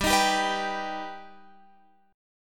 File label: G Augmented G Guitar Open F; G+ strummed; x,14,15,14,14,14; JGuitar